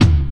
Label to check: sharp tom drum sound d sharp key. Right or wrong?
Right